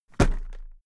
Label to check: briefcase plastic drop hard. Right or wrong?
Right